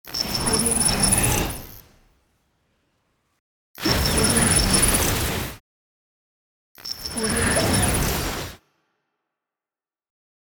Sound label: teleportation effect